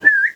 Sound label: complete